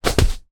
leisure inflatable rubber ring bounce on hard ground; zapsplat